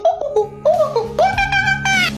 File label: dabstep tun tun tun